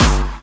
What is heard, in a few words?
Bassdrums Dirty, Kicks; Vengeance Samples